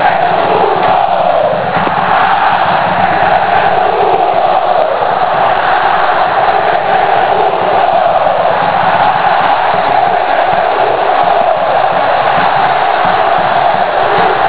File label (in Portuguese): grito torcida ah eh pernambuco; nautico 4x1 portuguesa